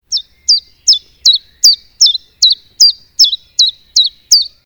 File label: Chiffchaff